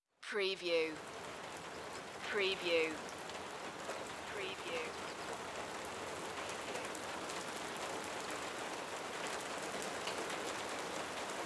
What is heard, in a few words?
Rain Light